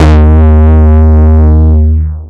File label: Root D#, Bass